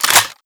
lewis bolt back, weapons, ww2; sven; ww2projekt

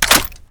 lewis mag hit, weapons, ww2; sven; ww2projekt

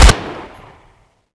ppsh shoot, weapons; sven; ww2projekt

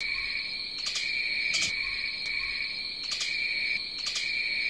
forest life; tudor street; valve

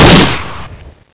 dp28 shoot, weapons; sven; ww2projekt

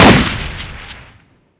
svt40 shoot, weapons; sven; ww2projekt

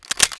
svt40 reload clip in, weapons; sven; ww2projekt

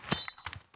c96 clip out, weapons; sven; ww2projekt

